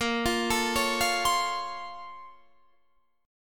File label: A sharp Minor Major 9th, Guitar Half Step Down